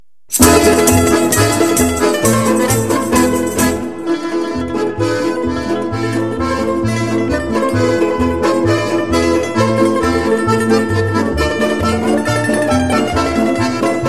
Korobuchka, Russie

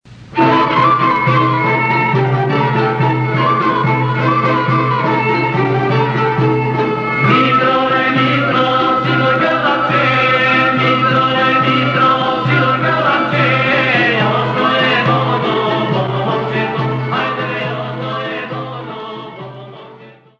Dramskoto Horo, Bulgarie

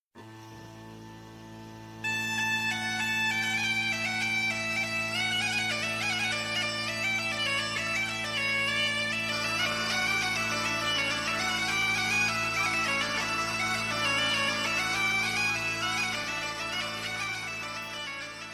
Bourree croisee, France

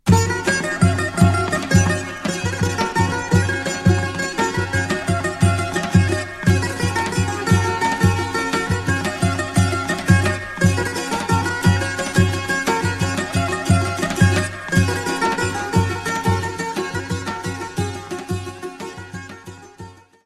Topansko Oro, Macedoine

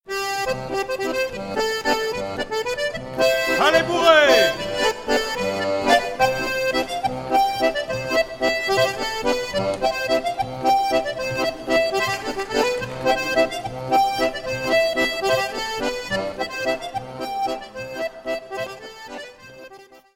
Bourree du Plessis - France

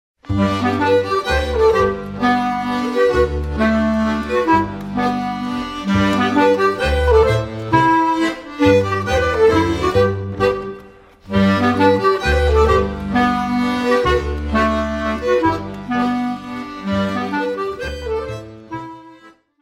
Mazurka, Belgique; Mazurka d'Auguste Pista

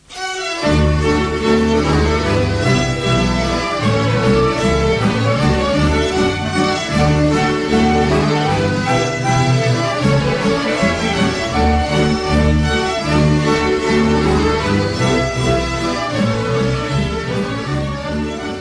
SCHOTTISCH - Danemark; PIGERNES SCHOTTISCH

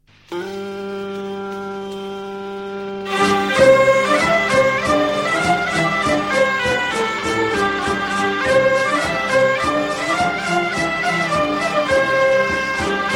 Maclote de Givry, Belgique

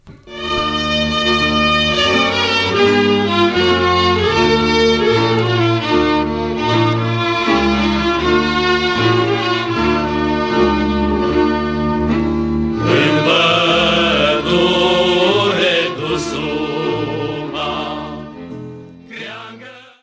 Roumanie

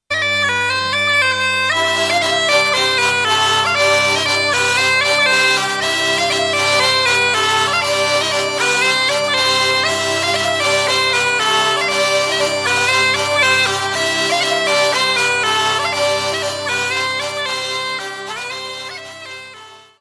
Polka - TRAD

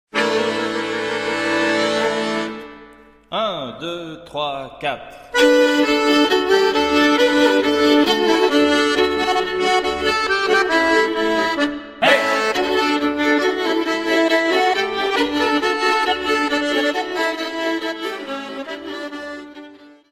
Circle Dance - Angleterre

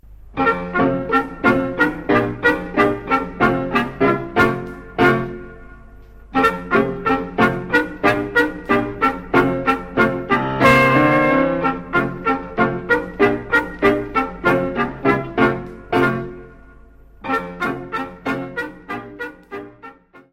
Valse sauteuse, Belgique; Valse sauteuse de Rett

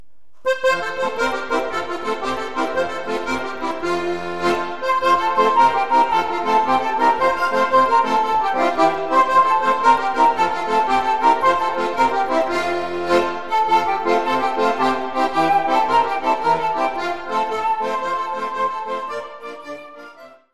Maclotes de Ferrieres, Belgique

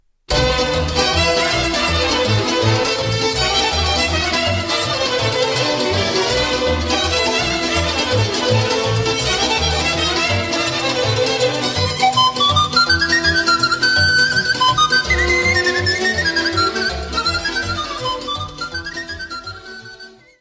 Sirba - Roumanie; Sirba din Udesti

